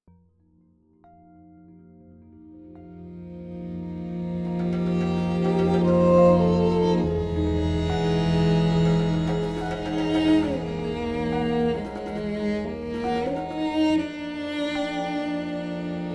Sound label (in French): Triste pluie re mix